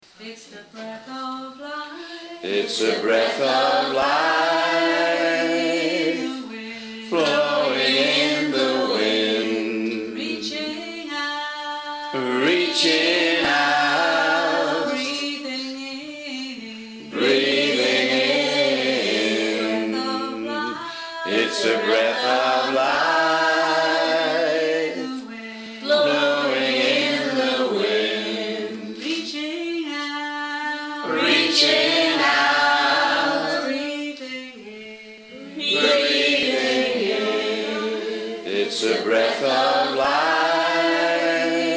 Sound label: Burnley singing